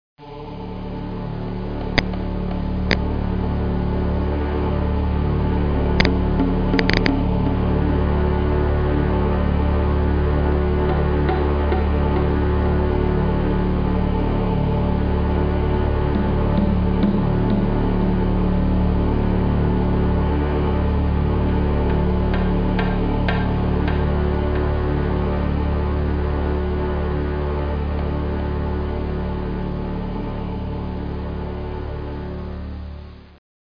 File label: Music Swell; senet